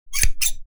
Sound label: Heavy hole puncher mechanical press